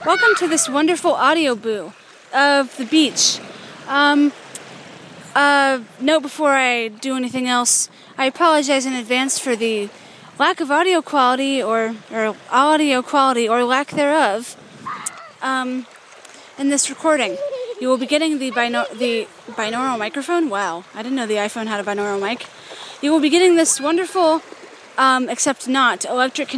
beach waves

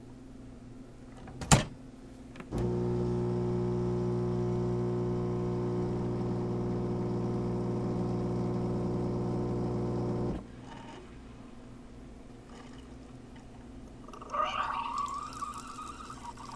sound of coffee machine

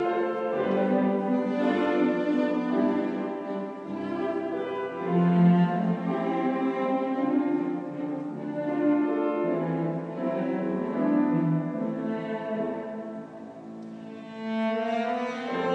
classical music in Salzburg

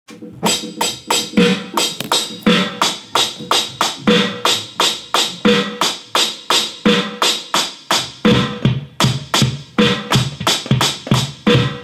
live druming recording

